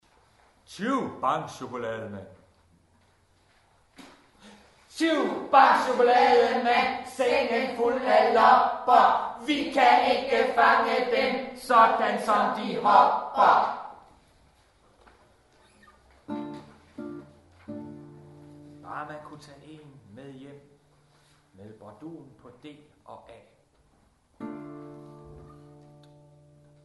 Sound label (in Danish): Sang